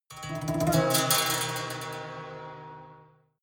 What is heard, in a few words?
haunted strings roll rattle